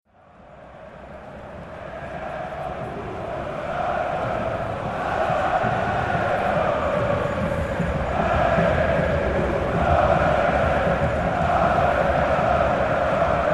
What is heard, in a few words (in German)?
Stadionatmosphaere, Borussia Dortmund, Fussball; zwischen Fussball und Pleite